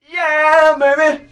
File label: "yeah baby", sung